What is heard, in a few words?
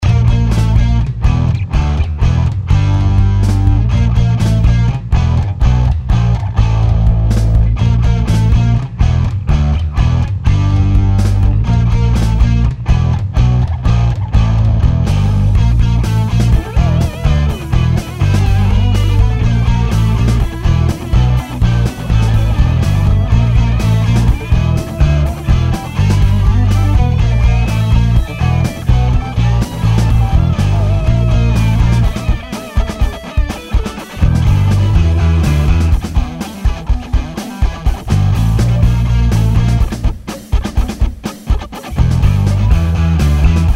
Music background pads